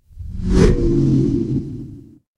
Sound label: transition whoosh